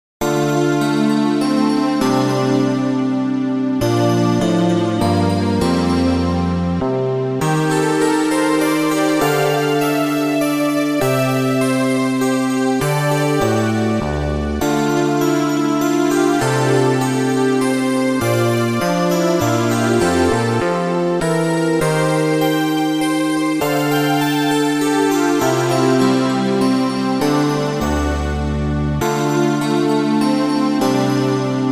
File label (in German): orgel